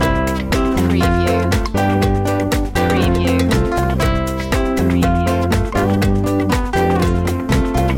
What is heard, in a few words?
montuno loop